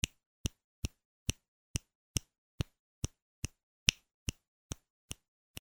Chasquido; Banco de Sonidos - Facultad de Art; Lazzeri - Gallichio - Alperte - Calvo

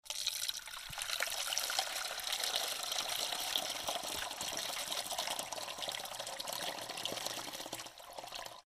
Chorreo; Banco de Sonidos - Facultad de Art

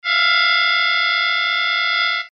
Estridencia; Banco de Sonidos - Facultad de Art; Díaz - Márquez - Loustau

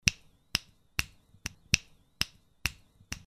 Chasquido; Banco de Sonidos - Facultad de Art; Leitao - Cazanave - Capelluti - Suasnabar

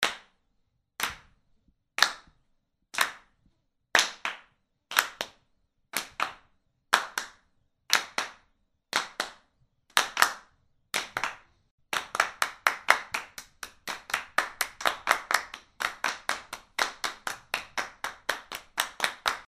Palmoteo; Banco de Sonidos - Facultad de Art; Leitao - Cazanave - Capelluti - Suasnabar

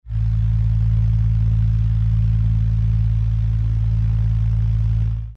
Zumbido; Banco de Sonidos - Facultad de Art; Díaz - Márquez - Loustau

